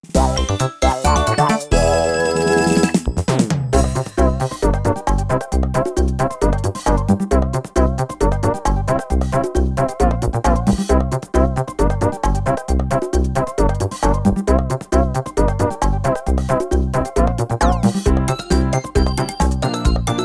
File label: bike high; Yokai Watch 2